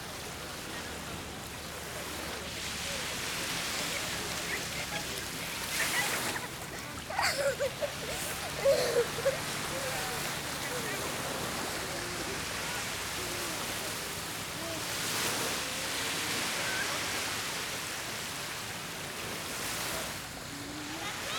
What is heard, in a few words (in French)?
plage; 6fours